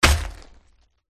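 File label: crystal golem attack contact middle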